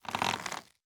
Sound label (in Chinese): 弩开始装填声